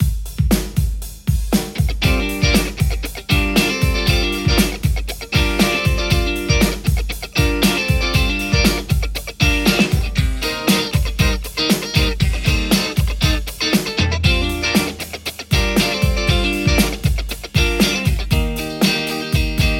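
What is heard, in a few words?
Stratocaster JCM800 hot; mercuriall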